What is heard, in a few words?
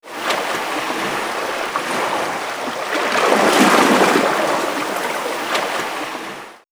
Nature Making Waves; X-Static Goldmine